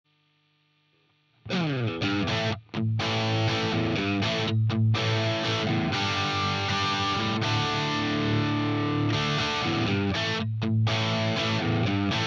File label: HB JA 60 dist bridge